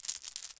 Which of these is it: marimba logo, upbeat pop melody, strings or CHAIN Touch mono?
CHAIN Touch mono